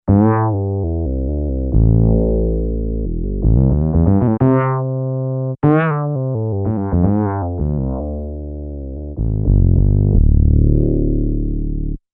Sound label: bass